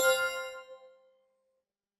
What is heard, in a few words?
time out